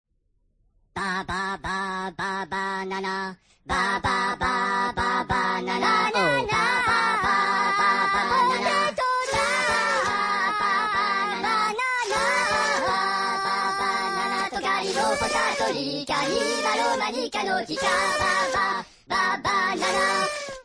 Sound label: banana song; Minion Music Box